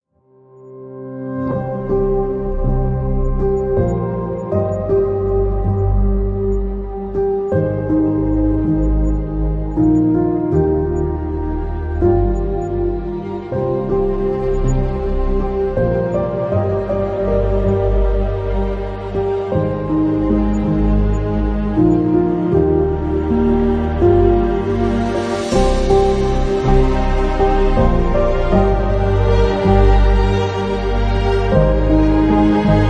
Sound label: Romantic sentimental emotional piano